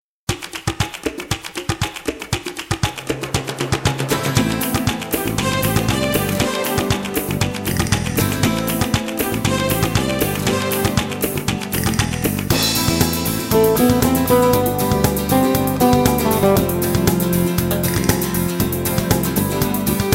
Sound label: Bongo Chica